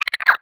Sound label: idle chirp, headcrab, creatures